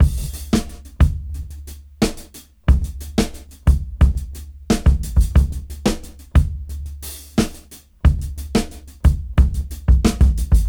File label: Rap Dry 89 BPM; AMG - Keith LeBlanc Phat Butt Jams